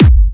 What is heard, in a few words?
Trance Kicks, Bassdrums